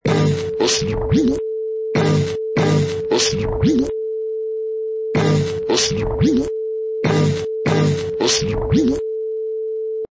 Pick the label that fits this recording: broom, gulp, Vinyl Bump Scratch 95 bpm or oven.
Vinyl Bump Scratch 95 bpm